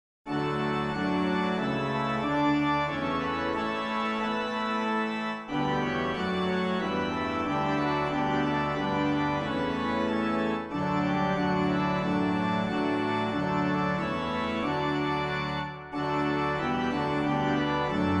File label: Organ